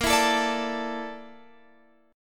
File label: Bb Major 7th Flat 5th, Guitar, Open F; strummed